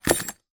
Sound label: household keys bunch down on cardboard box